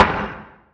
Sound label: asteroid damage oxide small; Factorio